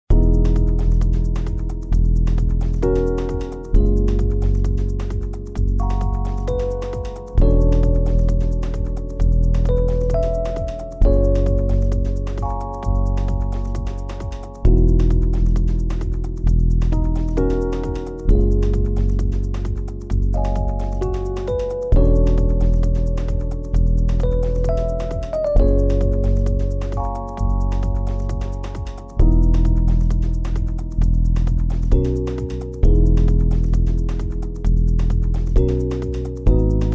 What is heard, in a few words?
groovy bgm; rewave